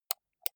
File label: computer mouse right click slow